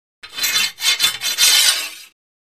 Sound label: Swords; Broad Swords Rubbing.